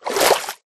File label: swim, liquid; Minecraft